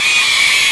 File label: Screaming; Earthbound